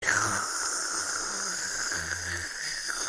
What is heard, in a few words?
raven slurp